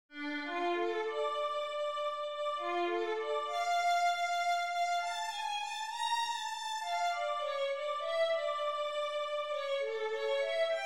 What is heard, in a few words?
etude, violin